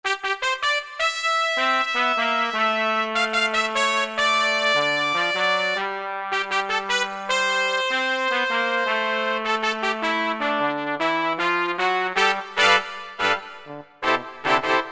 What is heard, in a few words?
Trumpets, Korg 05RW